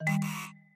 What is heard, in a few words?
wrong answer